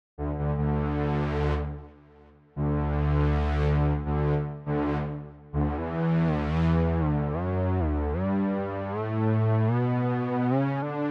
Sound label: Vacuum